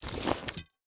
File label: Bow Reload